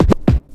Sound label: sinister, Percussion, Vinyl